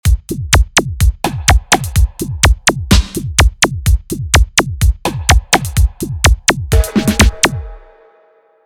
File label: wupp 130bpm, reggae